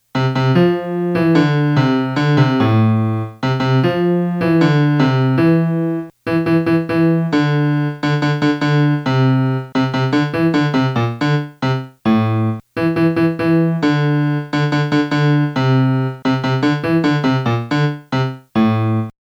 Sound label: melody